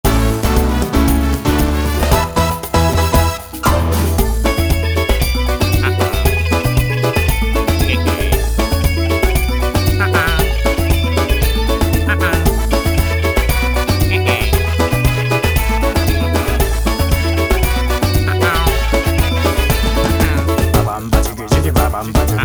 instrumental; Yoshimune; arcade

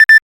Timer Count; zelda no densetsu remix; snes

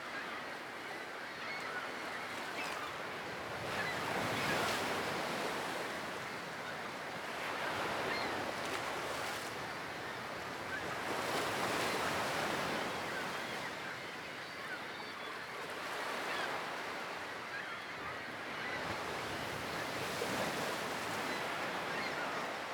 Ambience - day shore; gamerip; sim city societies

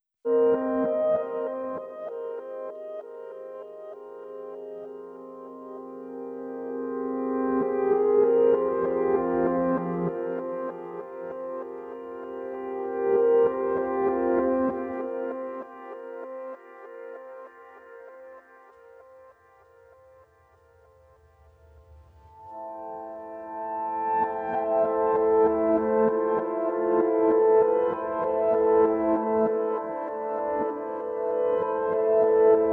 Icelake Reverse Piano

